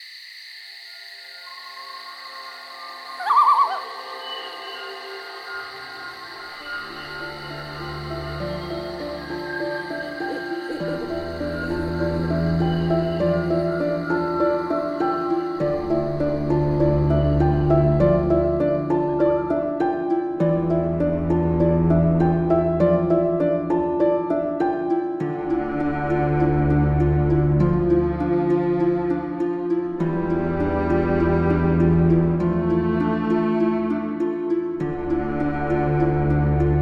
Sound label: music Elf Forest; Ballad of Solar